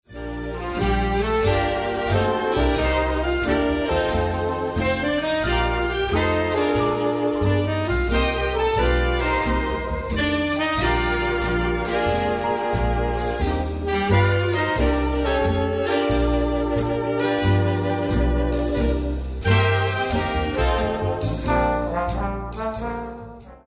Big Band

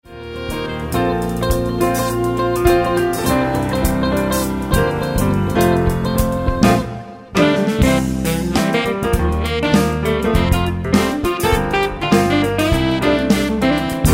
Jazz Fusion

